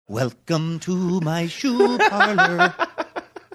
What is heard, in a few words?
singing welcome to my shoe parlor